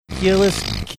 snorting

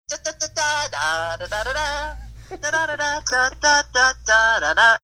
singing sparkler song

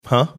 "huh"

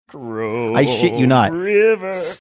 singing chrome river saying is hit you not

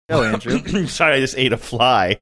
coughing saying sorry i just ate a fly

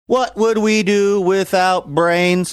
singing what would we do without brains

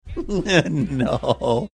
laughing no

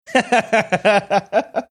cute laugh

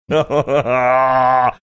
funny laugh

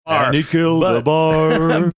singing and he killed a bar

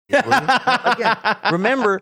laughing

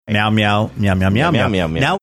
meow meow meow meow

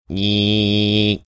making camera panning sound

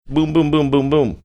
boom boom boom boom boom

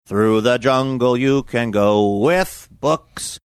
singing through the jungle you can go with books

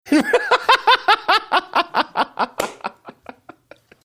laughing

